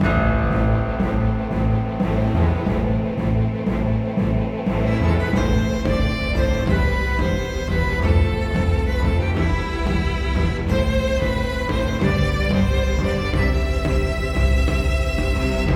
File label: northern forest, battle on stones